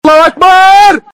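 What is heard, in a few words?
powder kegs; explosion; pvkii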